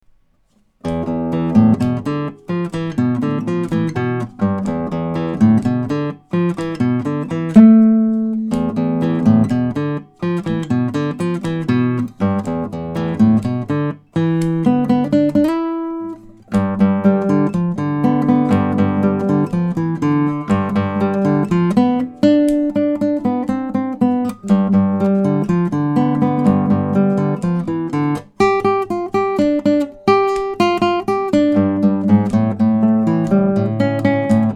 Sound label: Rocking Bass